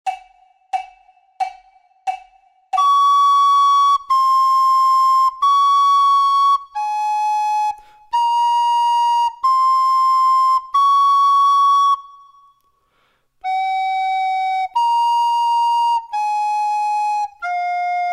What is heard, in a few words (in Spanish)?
DUETO FLAUTA